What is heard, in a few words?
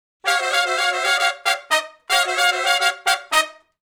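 Funk Riff (Eb) harmony, Horncraft for R&B; Sections; Crimson Blue and Fabulous Horncraft 4 RnB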